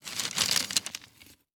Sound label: Sports Pole Plastic Tent Poles Picking Up On Dirt